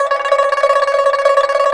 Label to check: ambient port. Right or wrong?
Wrong